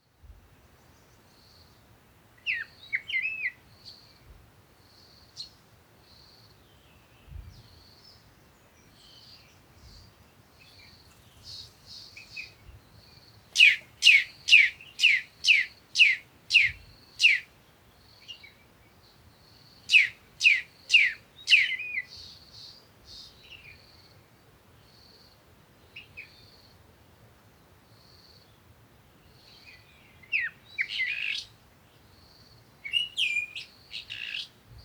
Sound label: african bush morning birds